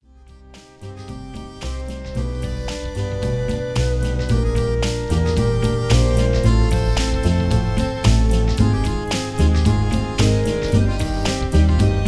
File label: tango